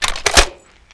saw box in

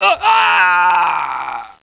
death; fgrunt

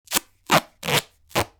repair sound; svencoop; th escape

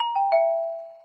surround side left; svencoop

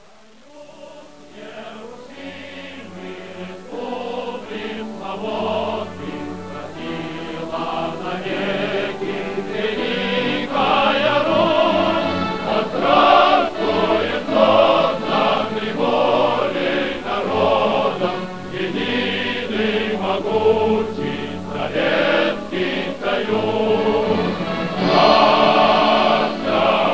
tetris music; svencoop; sc tetris

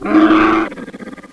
die; svencoop; voltigore

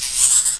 shock jump; shockroach

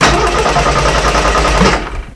train use; svencoop; hunger; hungerplats

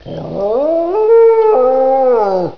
alert; hunger; hungerhound

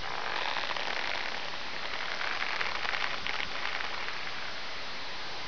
rope; svencoop; items

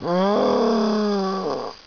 pain; svencoop; hunger; hgrunt

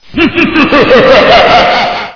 zombie alert; svencoop; schall

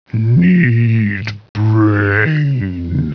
zombie attack; svencoop; hunger; hungerzombiecop

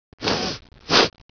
sniffle, scientist; svencoop

